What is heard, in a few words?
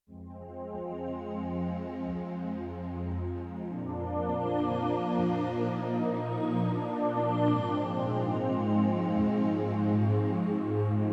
Slow Attack, Spacey Keys, Yamaha TX16W; Spacey Keys 2